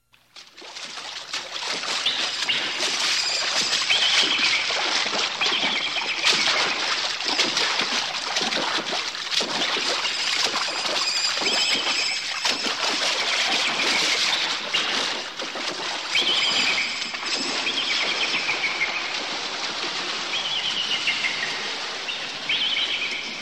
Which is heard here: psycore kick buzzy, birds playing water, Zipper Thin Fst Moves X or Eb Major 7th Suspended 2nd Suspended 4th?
birds playing water